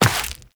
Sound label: Step Rock, Footsteps, Walk; Warframe; Characters; Tenno